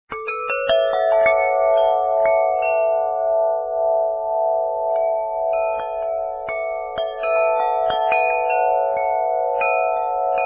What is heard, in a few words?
Alto, windchime